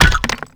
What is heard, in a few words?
Stone Pillar Debris Hit; Ambience; Warframe; Duviri; Murmur